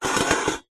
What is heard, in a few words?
foley metal tray lid rotate turn spin on metal short